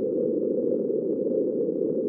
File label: thrust; McOsu